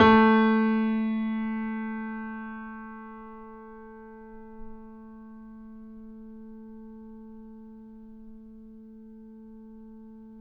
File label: Grand Piano MF A2; AKAI S6000 CD-ROM - Volume 2; Grand Piano 256M